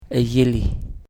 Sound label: drum